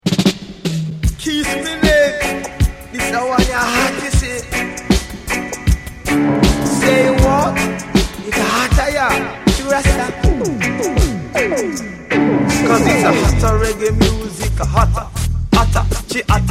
Blood And Fire

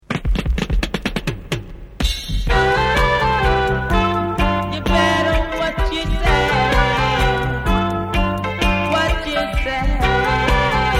Reggae Music; Jah Guidance

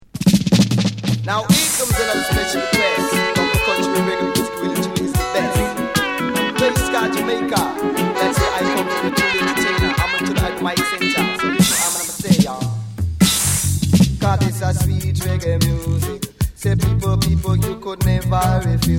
Negus Roots

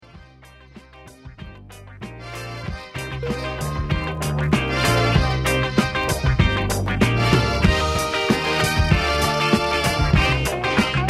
Civilisation Instrumental; Virgin

